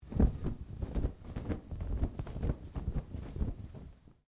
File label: Cloth flap sequence, Material flapping to simulate a flag or sails; Dressing; Human; SoundEffects Foundation2